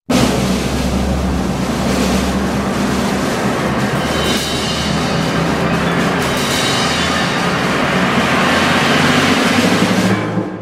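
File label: cacophony; Звук саспенса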